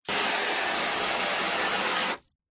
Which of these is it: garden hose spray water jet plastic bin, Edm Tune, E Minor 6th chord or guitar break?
garden hose spray water jet plastic bin